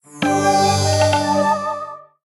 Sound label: win; Book Of Queen